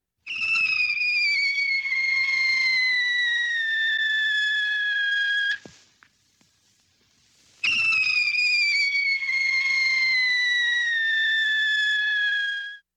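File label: bomb falling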